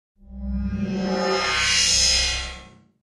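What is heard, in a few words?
Wash Electronic Tone Feedback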